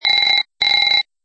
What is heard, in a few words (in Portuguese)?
Toque